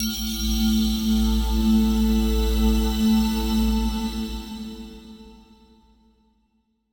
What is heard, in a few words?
SYNTHPAD, 125; Sphere